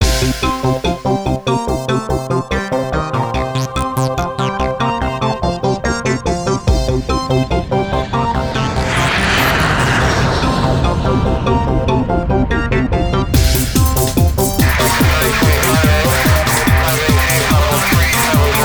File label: interface music; Airport Tycoon 2